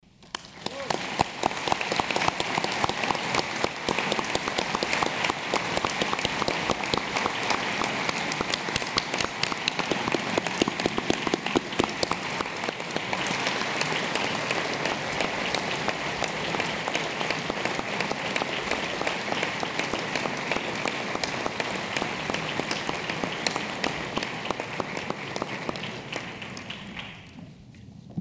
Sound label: Applause